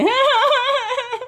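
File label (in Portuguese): risadinha de ladrão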